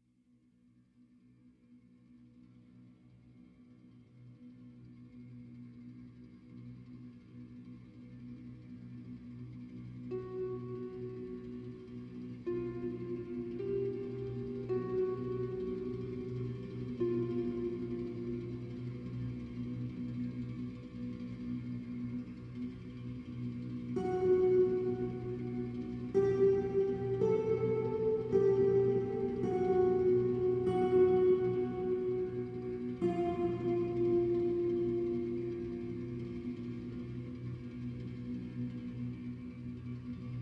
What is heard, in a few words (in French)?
Concerto en Mi Mineur Instrumentation Contemporaine